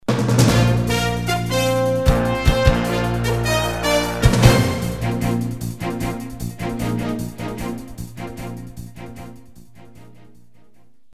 news sounder; ABC Information Network; 2001

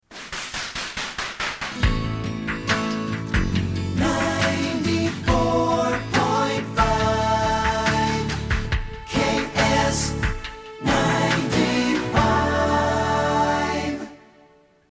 Jingle; KSTP FM

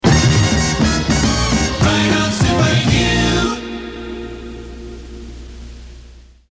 Jingle; WYOO; RO Super U

